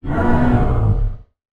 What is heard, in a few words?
dinosaur